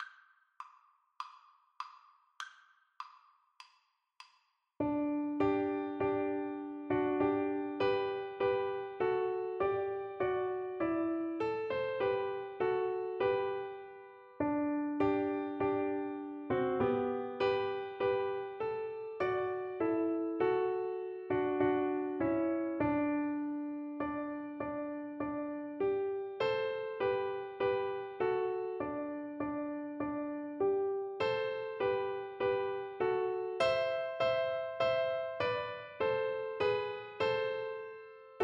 Piano Duet

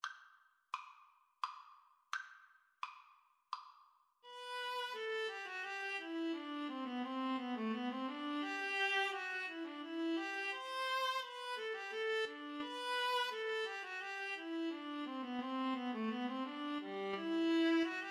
hornpipe Viola Cello; Viola-Cello Duet

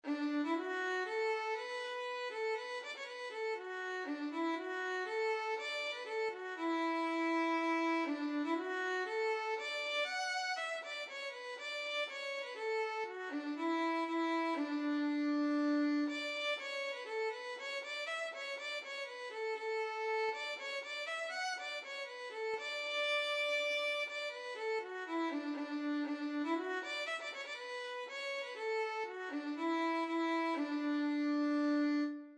violin

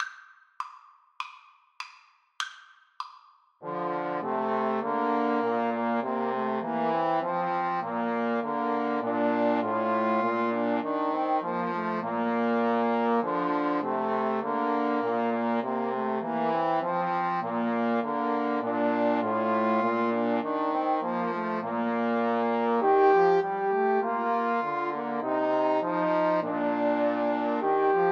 Trombone trio

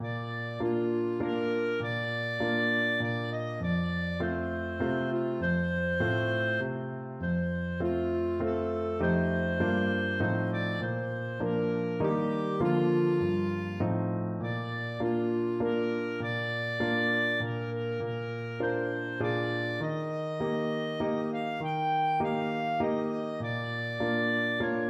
sing your way home, clarinet

